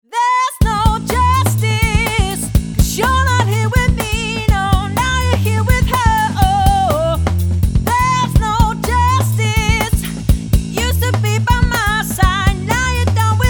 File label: mix uad 1176 comp